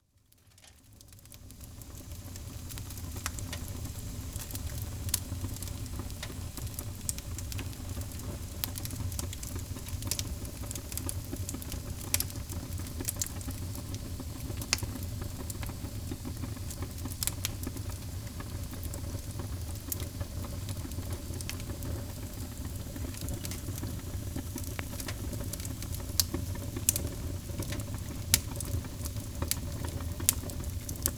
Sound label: fireplace; olympus ls-10